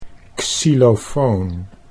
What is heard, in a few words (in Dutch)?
xylofoon